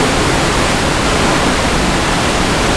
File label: waterfall; citysnow; XBOX; Antz Extreme Racing